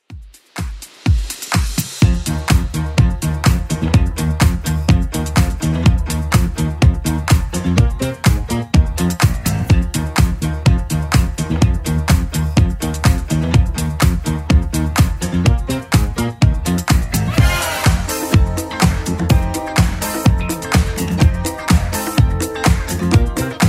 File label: 80s Club Edit 125 bpm; remixplanet